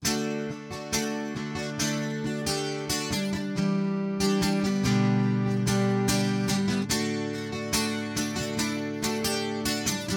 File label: acoustic martin d 28; Line 6 Variax JTV-69; 70er; d 12-28